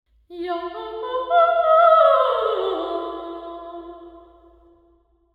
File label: baptist nashville balcony sing; 1st baptist nashville